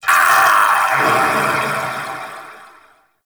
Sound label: Vocal Death Dissolve; Warframe; Duviri; Dax; Ashigaru